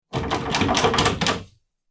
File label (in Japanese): 扉をがちゃがちゃされる音; door